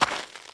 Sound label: gravel, footsteps; Garry's Mod; tf2